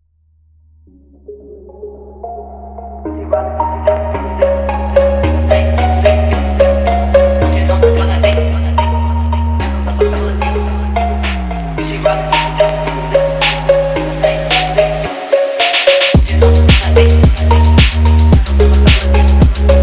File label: phonk